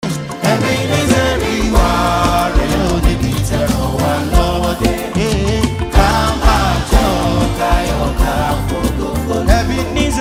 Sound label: Choir; CCC Apex Choir; Ebenezer